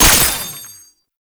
Lato Last Shot; weapons; Warframe

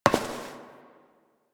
Step Metal; Warframe; Koumei

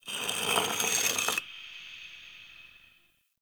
Stone Small Scratches; Ambience; Warframe; Entrati

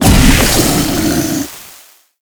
Flying Mouth Spawn; Enemies; Warframe; ManInTheWall

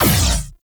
Gatling Laser Shot; Warframe; Railjack; RailJack Weapons

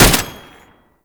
Tank Turret Shot; Enemies; Weapons; Warframe; Lasrian; LasrianTank

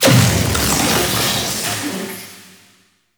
Vaults Keyglyph Activation Electric Hazard; Gameplay; Warframe; Entrati

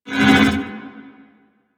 Void Portal Rotate One Off; Warframe; Ambience; Orokin; Oro Sabotage Objective

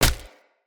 Unarmed Punch Impact Default; Weapon Impacts; Player; Combat

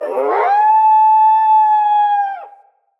Wolf Cub Trapped Call Far; Animals; Wolf Cubs; Orengal Wolf Cub B

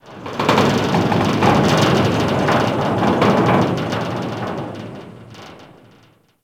Ship Rattle; Warframe; Corpus Ship Remaster

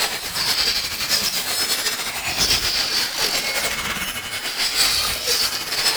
Teleport Proj Loop; Enemies; Abilities; Warframe; Tau; Dax Ninja

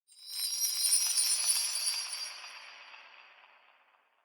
Small Bell Jingle; Warframe; Clan Dojo; Dojo Randoms; Ambience

